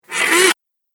Droid Head Turns Right, robot sound effects

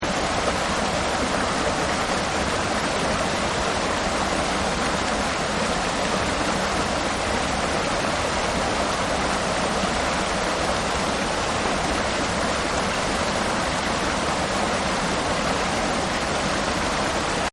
Waterfall; Rivers And Streams; Nature; Partners In Rhyme

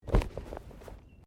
Body movement Clothing Foley; Body movement foley to simulate falls and deaths; Dressing; Human

